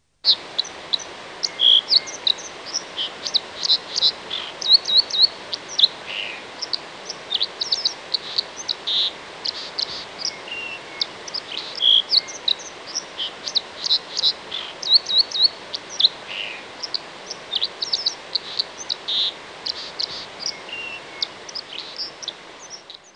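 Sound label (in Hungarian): Vízirigó; Madarak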